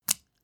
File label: step barefoot wet